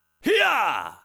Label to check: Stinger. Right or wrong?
Wrong